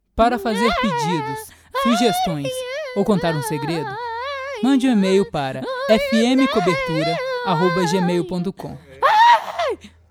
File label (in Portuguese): vinheta